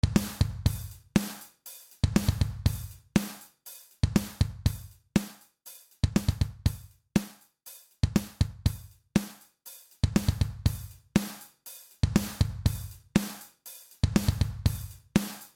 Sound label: less sustain